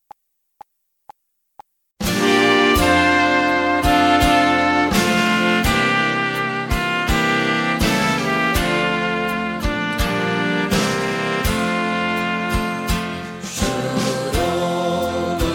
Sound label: Christmas Brass F; Auld Lang Syne